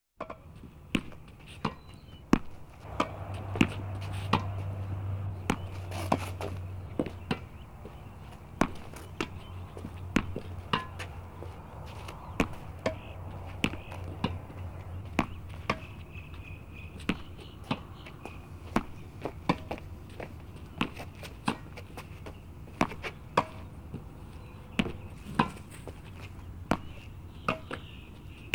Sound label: Tennis Continuous Volley; KMP Film Video Sound Effects